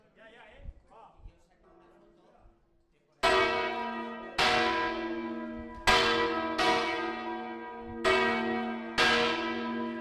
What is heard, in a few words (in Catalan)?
campana